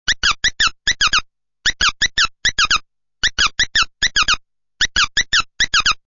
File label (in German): neuntoeter, Vögel; midi; Polyfonisk musik; siemens